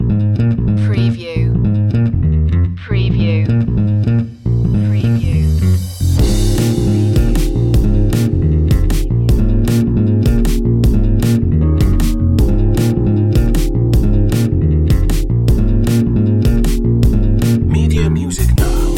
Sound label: chilled funk